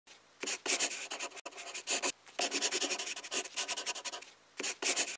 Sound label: sound effect pencil